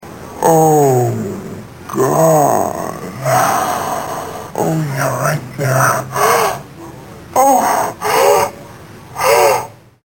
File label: ORGASM